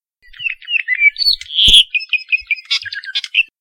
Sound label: apapane call